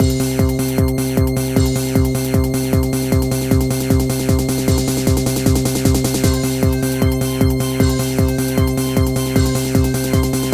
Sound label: Techno; Scooby-Doo Who's Watching Who; nds